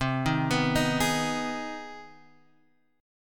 C Minor Major 7th Sharp 5th, Guitar, Half Step Down